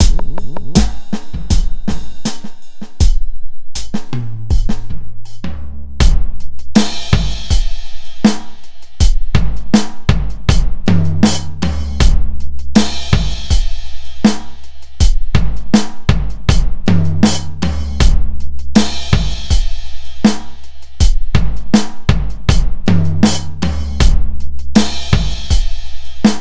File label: Metal sand drum loop